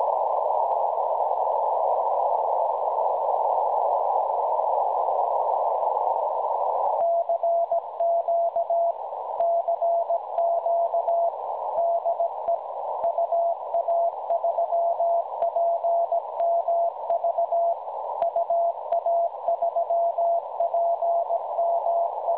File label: websdr recording 7023.1kHz